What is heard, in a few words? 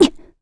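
Damage, Korean; Valance; kings raid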